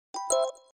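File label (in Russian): новости динг донг iPhone напоминают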